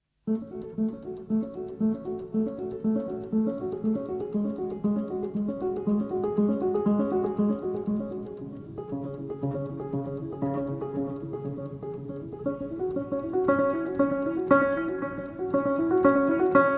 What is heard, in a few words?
Glass (Solo Piano)